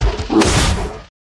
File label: WhiteBear A up